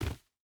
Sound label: footstep burlap dirt jump land; Rust